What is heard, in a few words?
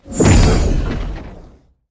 spiders bossfight drain charge loop start